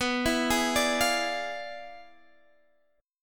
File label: E 7th Flat 9th, B